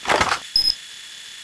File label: pipebomb draw